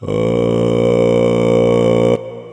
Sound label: Strohbass Fry voice